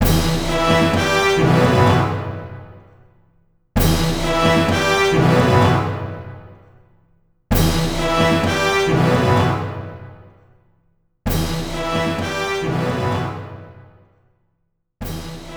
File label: Gothic Stinger; Orcs Must Die